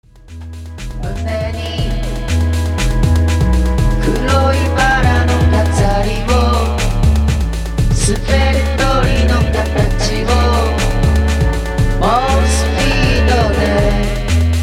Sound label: PIANO